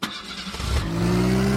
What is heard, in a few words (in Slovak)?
nastartovanie; zombieroad